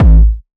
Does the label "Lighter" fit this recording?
No